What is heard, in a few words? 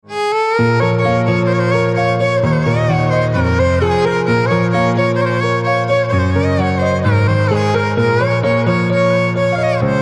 Violin, instrumental ringtone